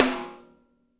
SNR; Spectrasonics; Burning Grooves

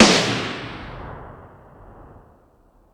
Tool Kit, Drum Machines

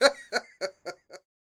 LAUGH; Spectrasonics Vocal Planet; Jazz FX

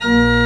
CHRCH, CHURCH ORGAN; Roland - LA Composer vol. 1

